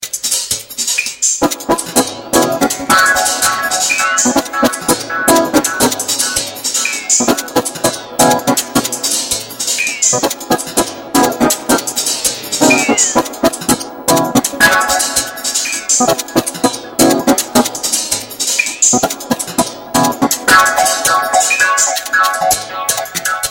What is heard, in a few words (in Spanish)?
organo hammond